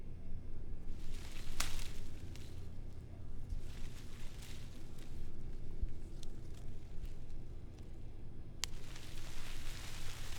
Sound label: tree leaves